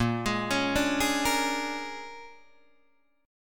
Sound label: Bb 7th Sharp 9th Bb Guitar Open D, chord